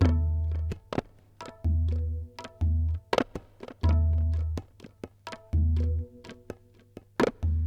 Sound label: PERC LOOP ETHNO 125